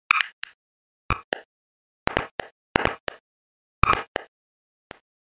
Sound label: Glitch Cassette; TRUTH-HZ; Crowdsourced Sample Pack; Digital FX